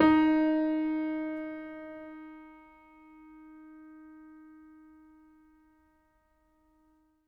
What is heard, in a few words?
MIX L, Acoustic Piano, PIANO STEREO; InVision Interactive; Miroslav Vitous